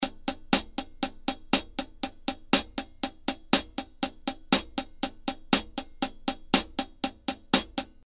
120 HAT VINTAGE EIGHTHS

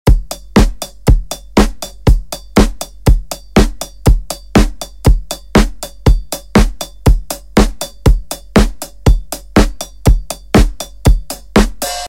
120 TAPE SAT LOOP

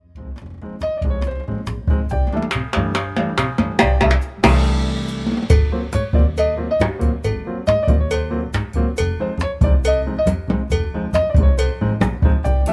Lullaby Medley

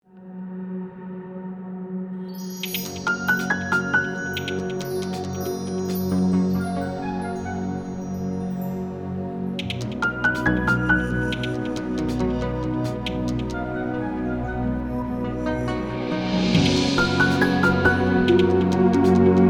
STING AMBIENT

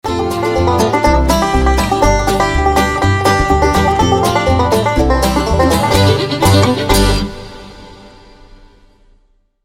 Transition; Hiawasee

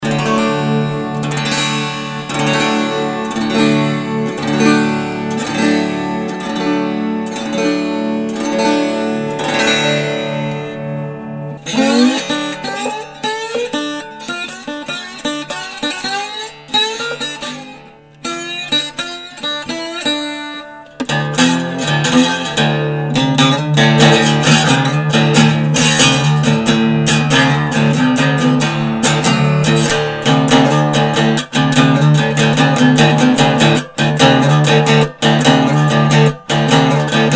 Resonator

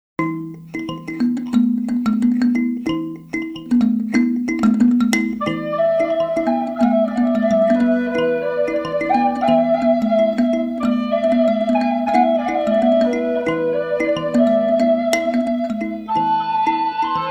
Kalimba, Alto Recorder, and Melodica

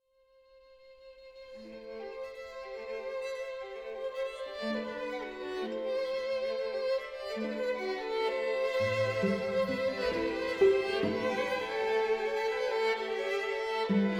Ravel Quartet

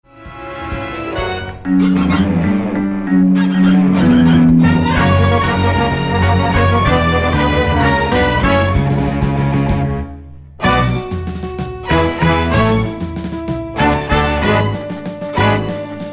Tango de Amor

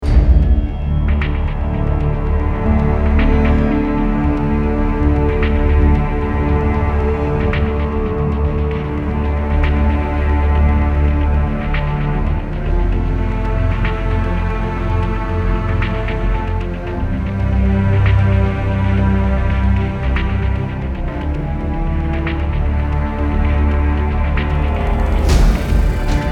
Epic

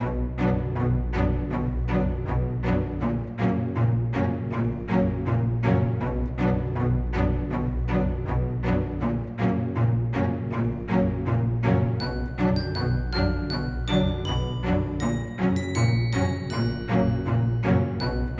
magical orchestral bpm80; archibald editions; belgian magical

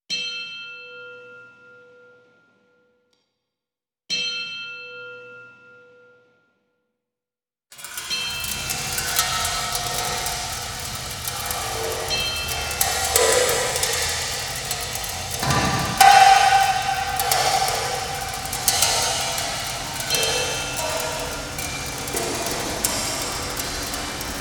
Dissonance Ambience; Music for the exhibition MIGUEL ANTONIO HORN: DISSONANCE

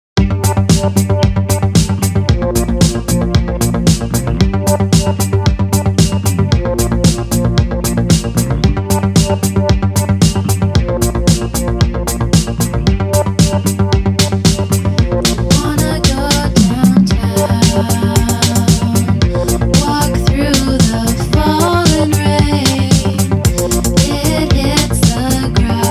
Drops of Rain

